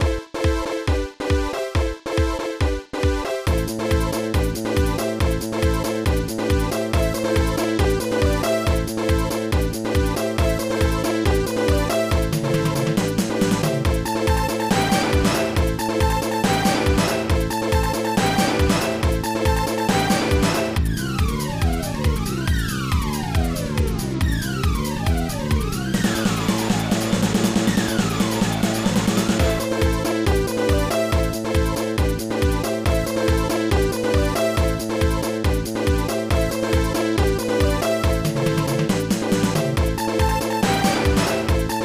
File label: Overall Victory; GT Racing; SNES